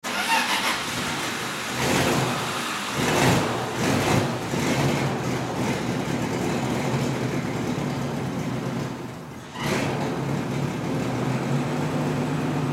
Tractor Tow Aircraft Start Up Run Pull Plane Turn Off